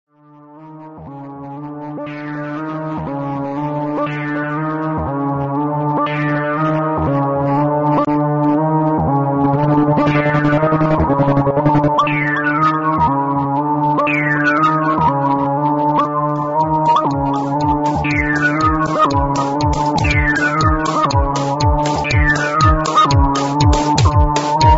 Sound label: ambianteur airwaves